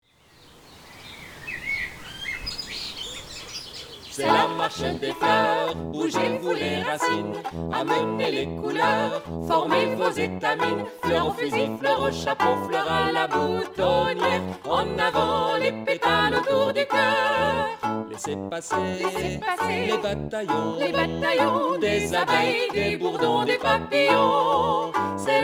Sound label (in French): marche bass MIX